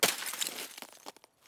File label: ice break